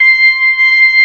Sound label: B3 C 6; Best Service; Advanced Media Trax Modern Composer; B3 SMALL2